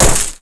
gun glass impact

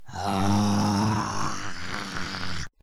zombi

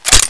box in, Reload

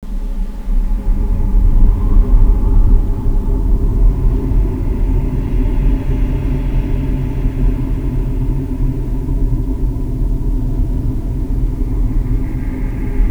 Scary, Ambient

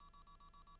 Javelin Locking on; WarRock

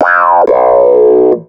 Lose

